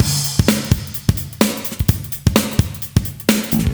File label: House Beat, 128 BPM